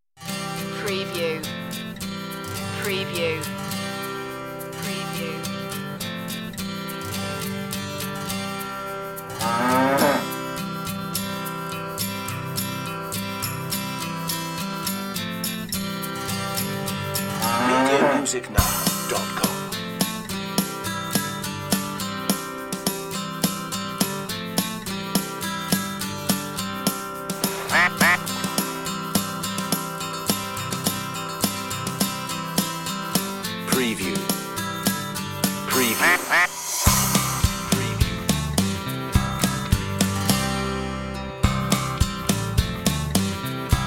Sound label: old macdonald had a farm underscore